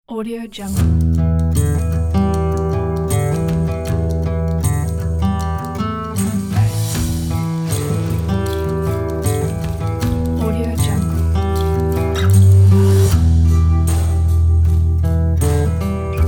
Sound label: stylish western rock